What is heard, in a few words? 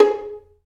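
Vio pizzicato G#3; Digital Sound Factory Virtuoso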